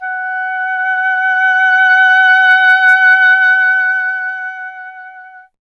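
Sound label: oboe, wind; Miroslav Vitous - Solo Instruments VOL-2; Solo lnst. II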